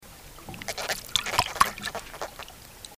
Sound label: Doradid, Amazon Fishes